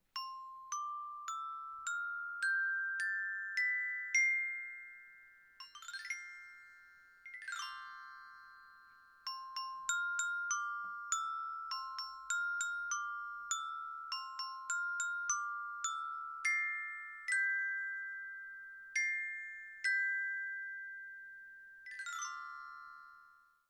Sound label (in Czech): metalofon